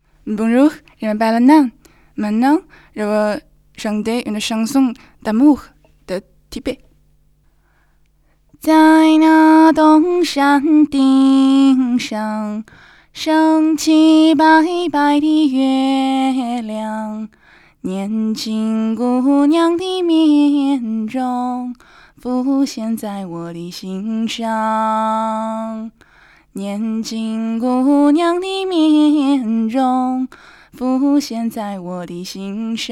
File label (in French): chanson tibetaine; Ondes de Chine; Lille 1; chanson tibétaine (mai 2011)